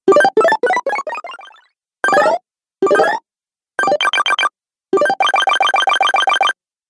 Retro Videogame Fx Sounds Pack, Video Game Sound Effects